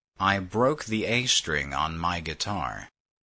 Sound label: string